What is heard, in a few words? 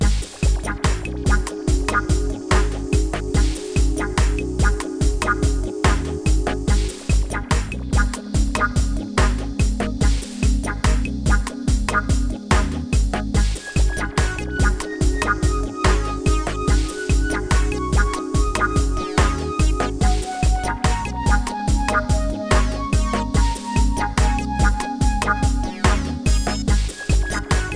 Reggae; cdr presenter lite